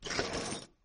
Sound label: move; jocly; tafl